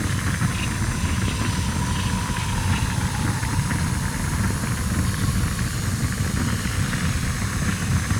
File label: Mortar Trail Loop; Evolve; Behemoth